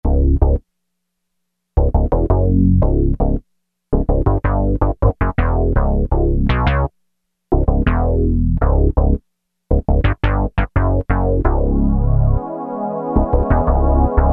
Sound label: Synth Bass - Pad, Roland MKS-70